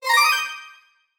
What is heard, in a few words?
start; pinball; Chocolate